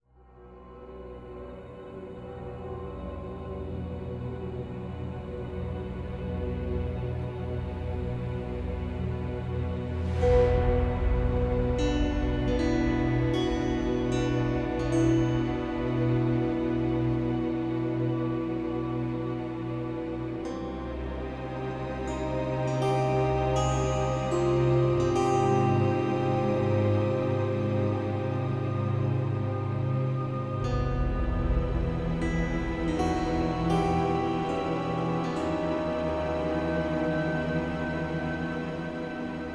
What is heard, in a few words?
Music Level Citadel; Music Level Citadel Cond 1